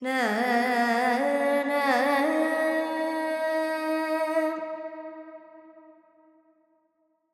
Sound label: Verb Dorian, Vocals, Carnatic Female, Alaps, 70 G